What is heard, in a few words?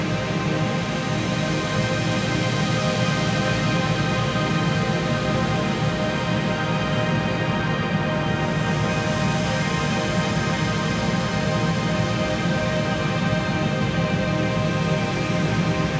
pad; hot lava; ios macos windows